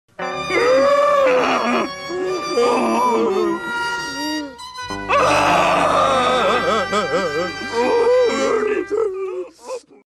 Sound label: crying; cookie monster and ernie